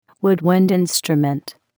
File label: woodwind instrument